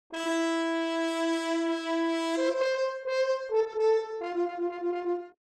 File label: Horn